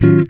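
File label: guitar chord funk; Univers Sons; Jazzistic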